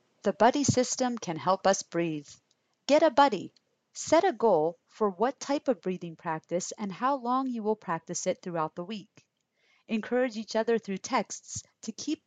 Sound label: Breathing